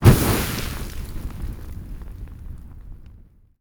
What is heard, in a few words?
Arrow Launch Fire; SteamVR; InteractionSystem